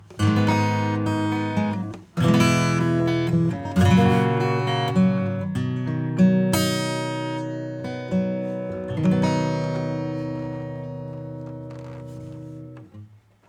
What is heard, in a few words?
acoustic bypass